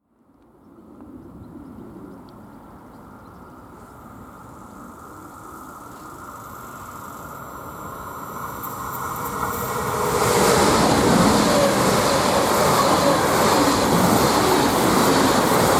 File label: deutsche bahn sound